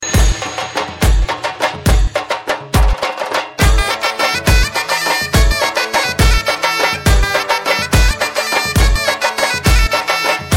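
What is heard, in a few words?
Desi Beats Remix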